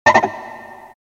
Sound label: guitar cut